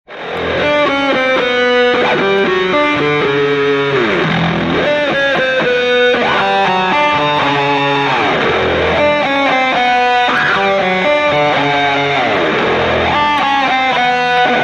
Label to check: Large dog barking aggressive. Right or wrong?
Wrong